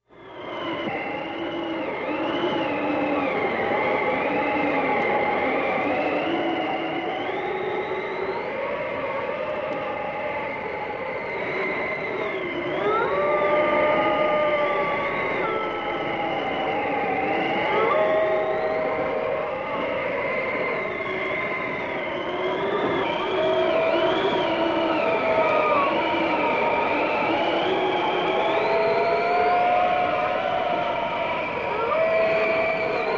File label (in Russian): zvuki zimy